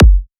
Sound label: kick, drum hits; Electro; Anthemic